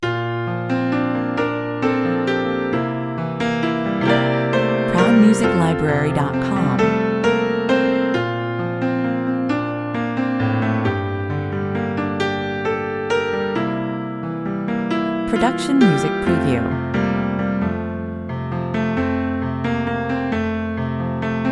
mellow waltz 133 bpm

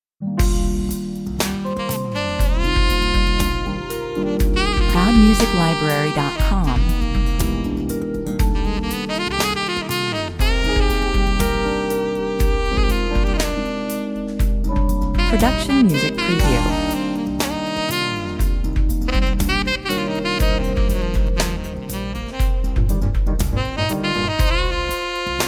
arabian groove 120 bpm

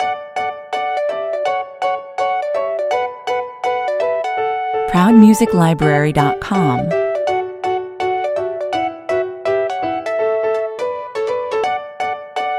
happy explainer loop

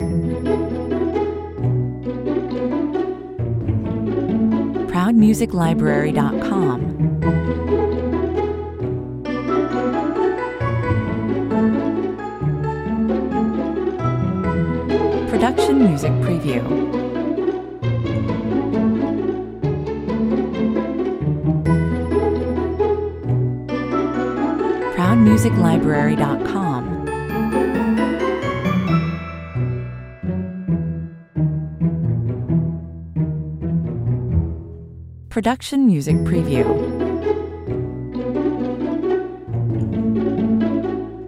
no drums perc

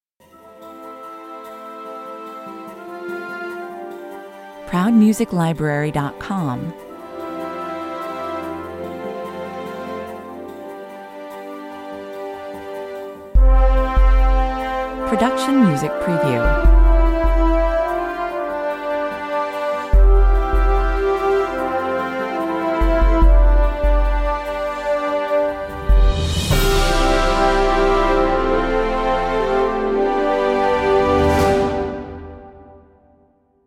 commercial

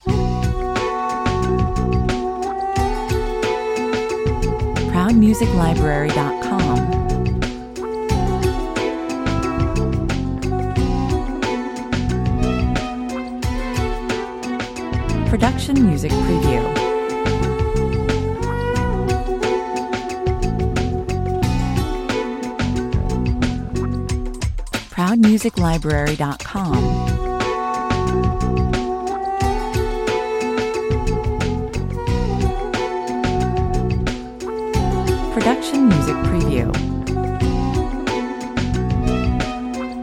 radio morocco 90 bpm

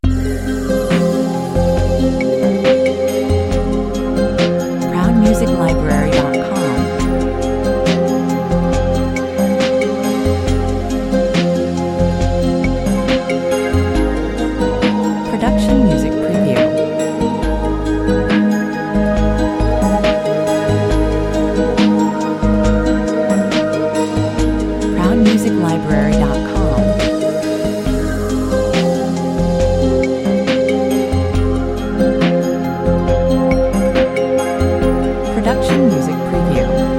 blue waves (talkover)

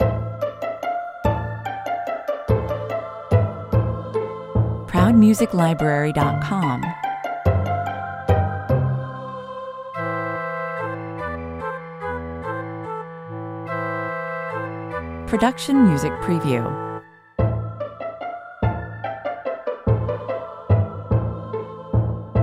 tiny minuet 144 bpm

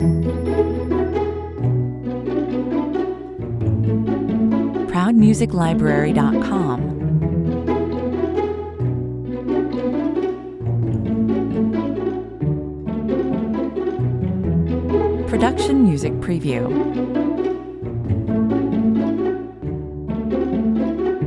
(no lead no drums perc)

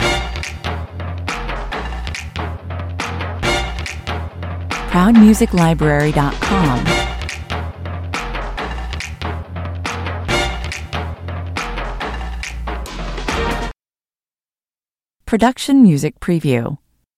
shuffle kerfuffle (loop)

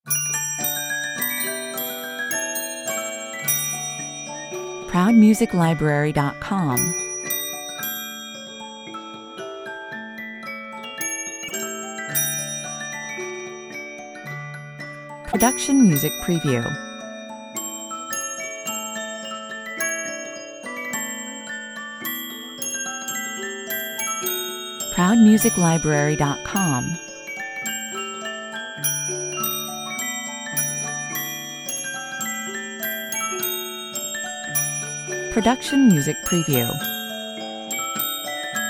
charming alpine music box

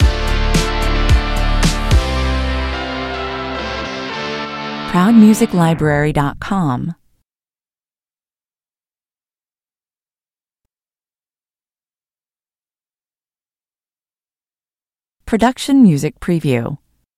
stinger

